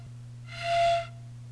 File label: plastic Flute